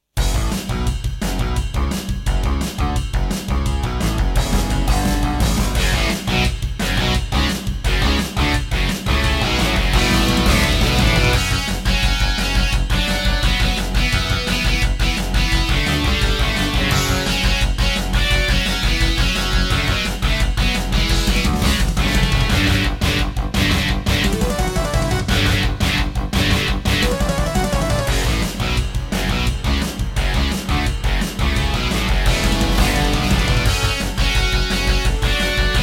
Intro, Serdaco Dreamblaster X2GS; Duke Nukem 3D

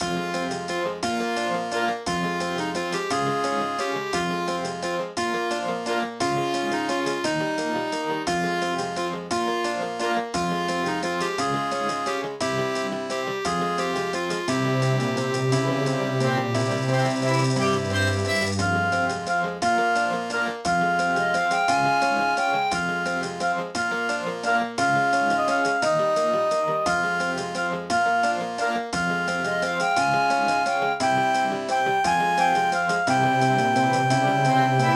Track 6, Alesis Harmony 32; Death Gate

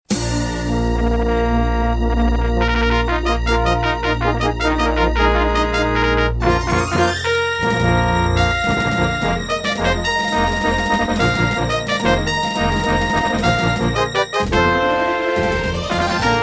Intro, Gallant GMW-1000; Dark Forces

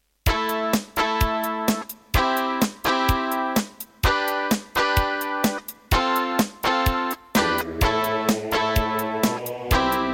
Wave 1 [Trust Korg Super Sound Module]; Raptor

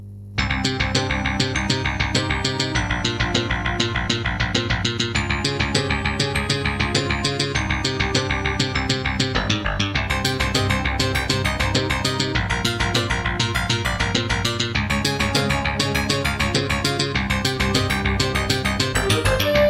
Introduction, Creative Wave Blaster II; Hocus Pocus

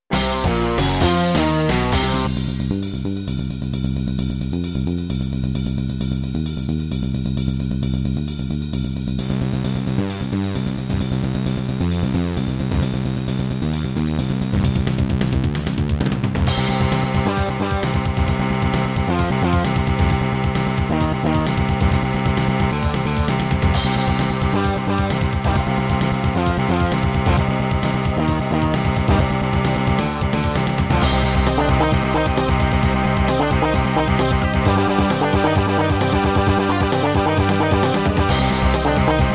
Roland Edirol SD-20 GS MODE; sigil doom episode 5

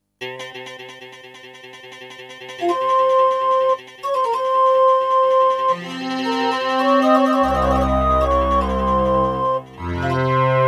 Intro, Aztech CS9233 DB; Prince of Persia 2 - Intro

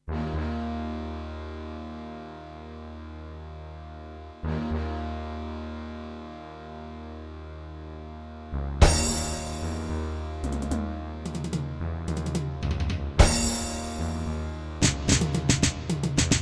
EMU SoundEngine

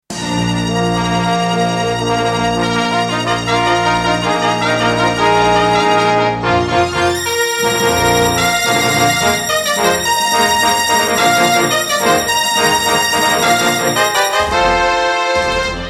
Intro, Creative SB AWE32; Dark Forces

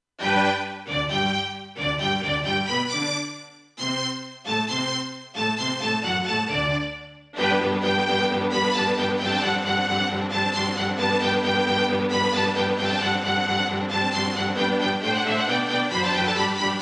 Ensoniq VIVO 90DB-A; classical; Mozart; Eine Kleine Nachtmusik partial

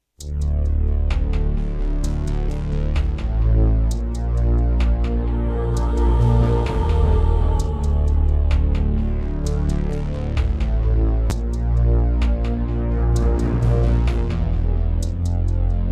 Serdaco Dreamblaster X2GS NATIVE; Descent II

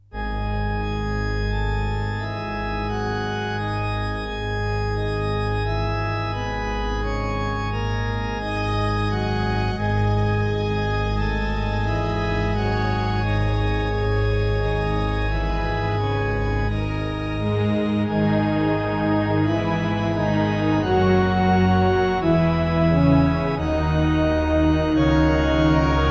Terratec MiniWaveSystem WT64; Azrael's Tear

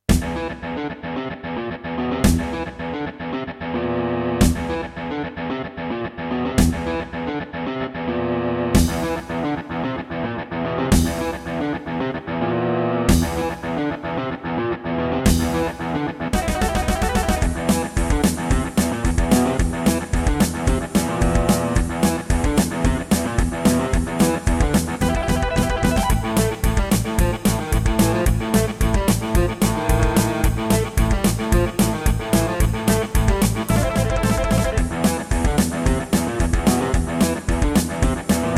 Yamaha DTXpress; Doom

